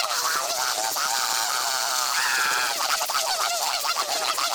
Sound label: Flying Half Loop